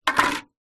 foley tin small empty no lid with water in down on ground; zapsplat